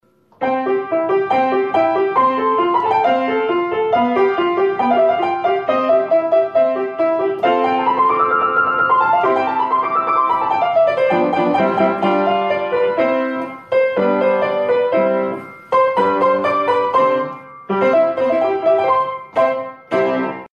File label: Piano improvisation